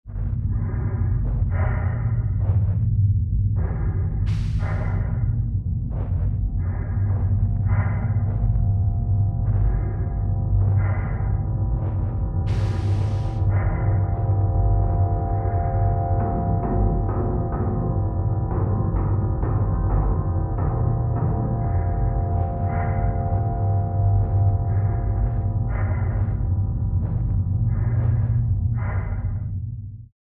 Spaceman fixing a generator

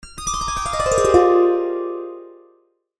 Dulcimer gliss

